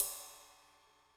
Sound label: Ride, Cymbal; Yamaha DTXpress-II